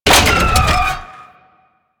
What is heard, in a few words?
Thumper Bot Leg Damaged A; Warframe; Grineer